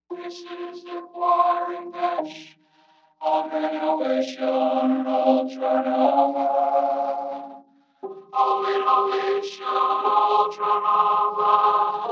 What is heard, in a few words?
Choiring; Novation UltraNova; Factory Patches